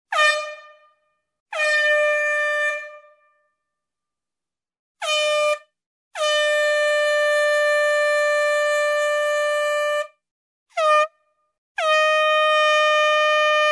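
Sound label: vuvuzela sound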